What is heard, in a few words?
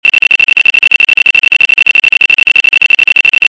ringer